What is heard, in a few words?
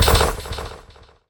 Menu Basketball; Menu FX